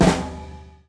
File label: snare Hit; minecraft story mode gamerip 2016; Order of the Stone